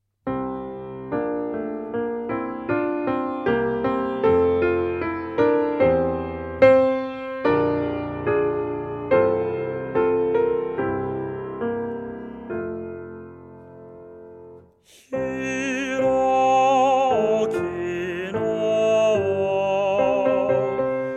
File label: song solo